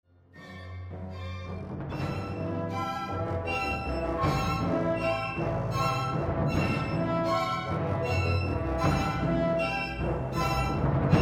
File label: bass trombone; Richard Ayres No 42 In the Alps